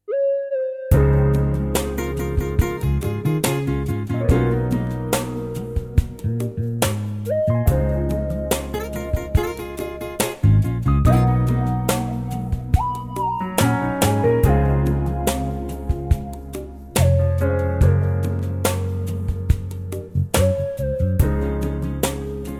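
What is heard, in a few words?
backing track; key - Db - range - Ab to C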